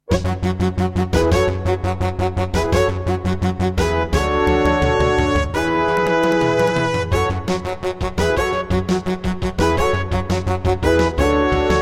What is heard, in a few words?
Intro, Roland MT-32; 4D Sports Boxing